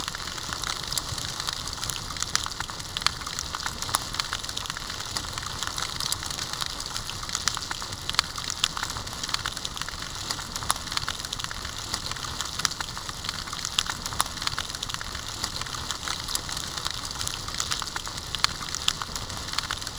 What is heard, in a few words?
lantern lo freq